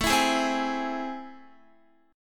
A Minor Major 7th, down, Guitar; AmM7; strummed

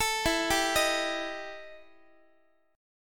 E Minor Major 7th Double Flat 5th E Guitar; arpeggiated; JGuitar

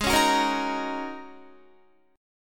Ab 7th Suspended 2nd Suspended 4th down Guitar; strummed

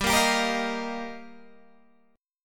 G Minor 7th Sharp 5th, Guitar, Open G

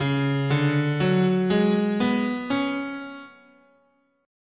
C sharp Traditional Japanese "in sen", scale

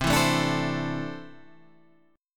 C 6th Add 9th C down Guitar Drop D

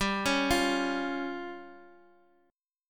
C sharp Major Flat 5th G

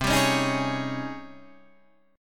G sharp Major 11th C

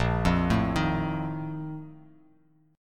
A sharp Major 7th Suspended 4th; arpeggiated; JGuitar

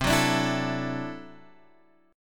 D-Major Add 9th C down Guitar Standard; strummed; JGuitar

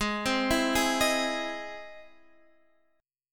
E Augmented Major 7th Ab

